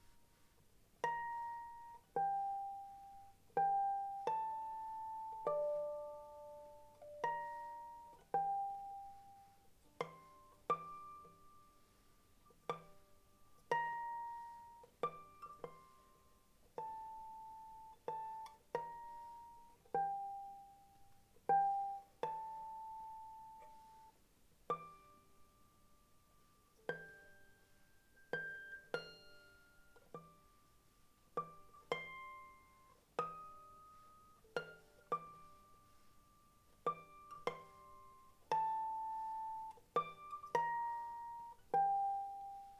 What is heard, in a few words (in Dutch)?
Improvisatie